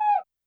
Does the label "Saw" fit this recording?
No